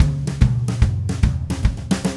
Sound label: Red Maple Groove 110, DW Red Maple Kit